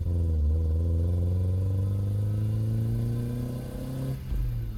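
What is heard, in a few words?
engine low loop; Blind Drive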